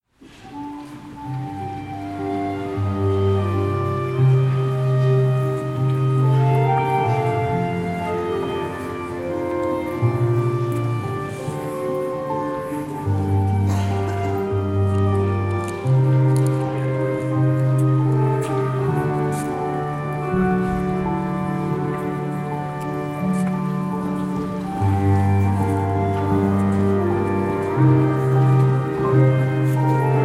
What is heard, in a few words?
Instrumental; St Jo